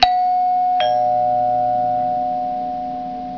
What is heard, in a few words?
two note, doorbells